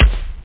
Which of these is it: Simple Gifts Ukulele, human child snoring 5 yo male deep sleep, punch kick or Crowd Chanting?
punch kick